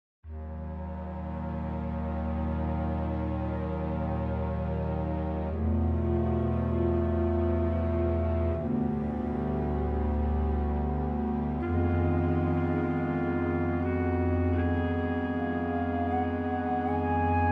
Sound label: Slow, Low Orchestral, Dark and Mysterious